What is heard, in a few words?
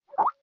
bip